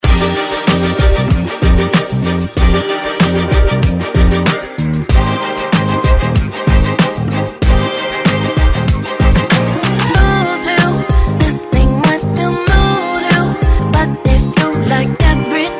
Drum Refix 95 bpm

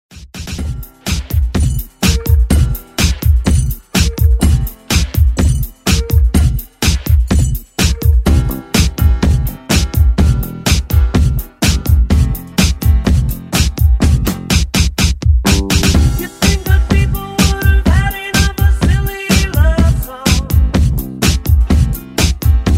70s Ext Redrum

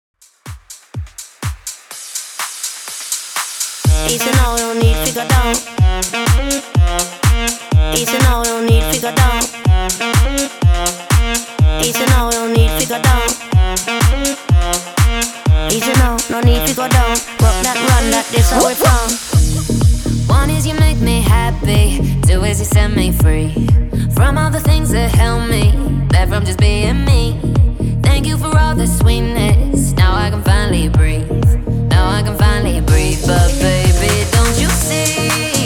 124 BPM

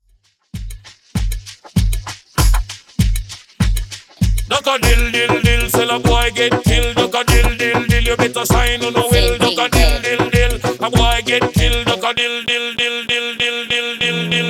Tone Play

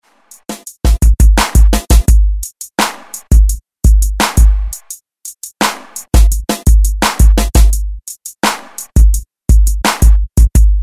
twerk

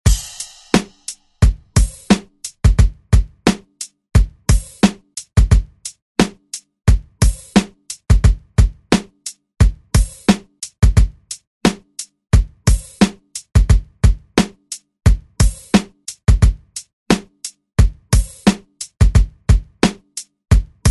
90s ReDrum; DJ Mhark

